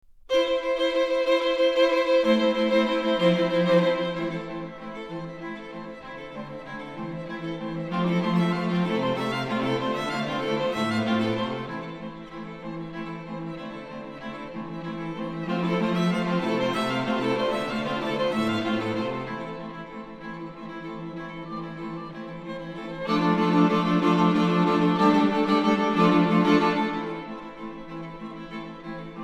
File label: String Quartet No. 2 II